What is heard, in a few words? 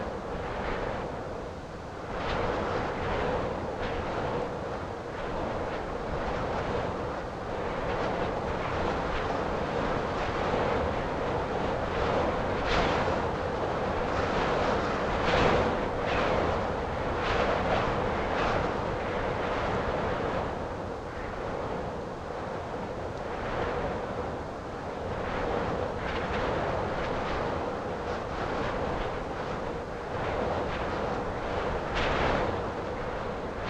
skydiving wind; Scrat